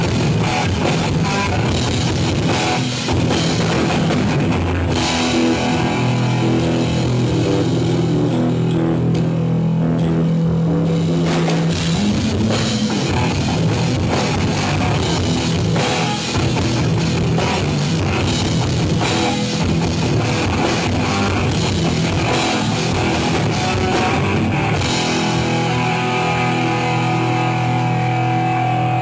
Jam Session

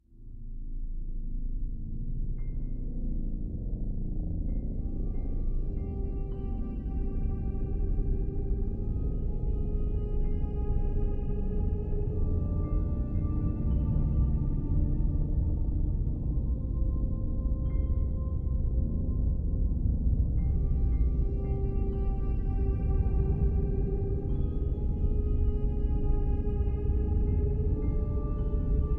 creepy horror

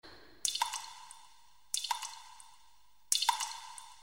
Tip Waterdrop

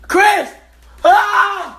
AHHH

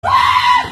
WAhh

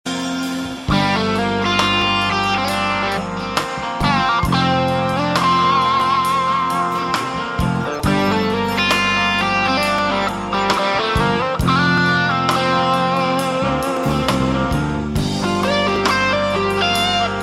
Guitar Lead

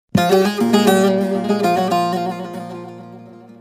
Baglama

